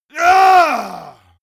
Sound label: monster growl attack throaty; schreie